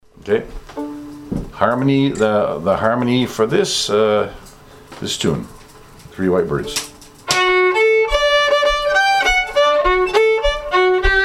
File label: harmony birds, violin